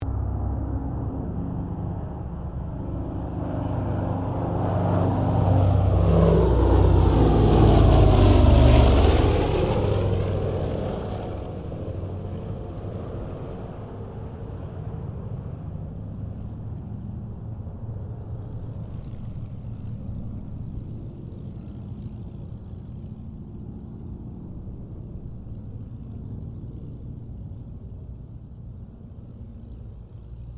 AIRCRAFT LOCKHEED L-1049 SUPER CONSELLATION PASS INTERIOR; Connie, Super Constellation; 1955; Airplanes